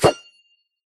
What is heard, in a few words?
throw, trident; Minecraft